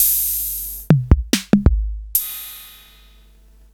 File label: Roland Tr-606